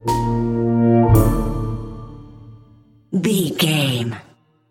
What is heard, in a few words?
trick fool con and swindle button